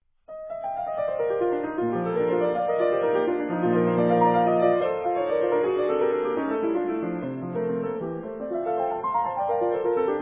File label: piano works, Toccatina B major; SMETANA; KOMPLETNI KLAVIRNI DILO B SMETANY